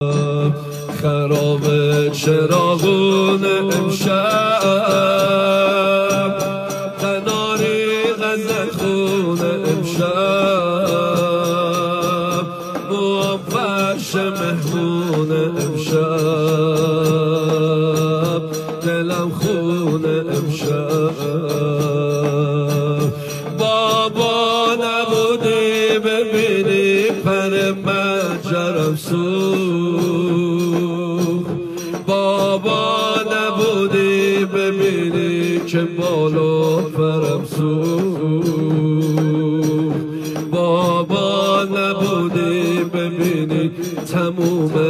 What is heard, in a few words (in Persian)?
مداحی